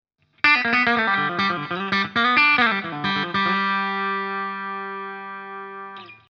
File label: Custom 22 Steg clean; PRS S2